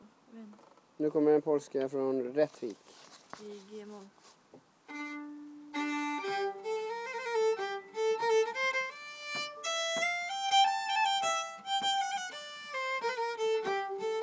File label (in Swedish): polska fr rattvik; Marit